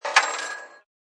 Arcade Game Coin Drop, Ringing sound of coin dropping into arcadegame.; Games; Coin Drops into Arcade Game; Arcades